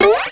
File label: mtl bnce; lomax